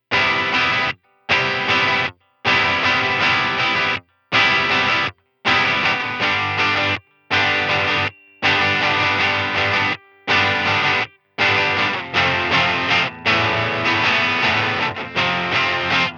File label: Breakup, Fender Twin Reverb; EQD Plumes; Twins